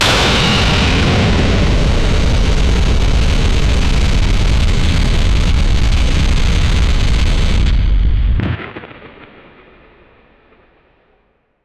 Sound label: soft sounded military mis; warfare